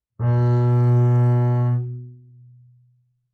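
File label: Bass arco fortissimo sul A B2, Double Bass; MIS Pitches - 2014; Strings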